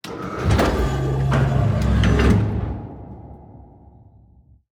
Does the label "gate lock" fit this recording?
Yes